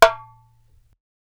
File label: darbuka note